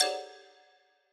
xylophone strike

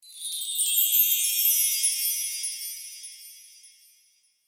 bar chimes

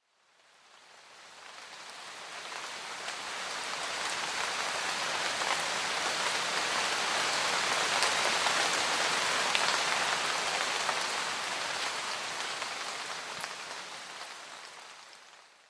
It's raining; Sound-makers

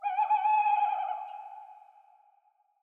owl hoot; owl hoot 3

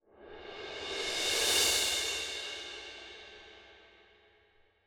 shimmering cymbal sound

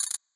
robot chirrup

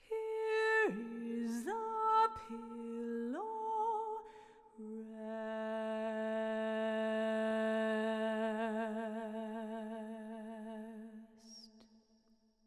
Hush, Lullay, vocal phrase

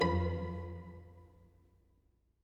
pizz. chord

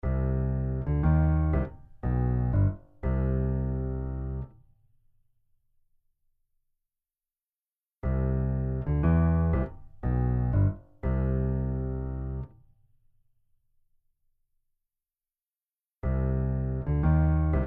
Melodic motif, notes changed

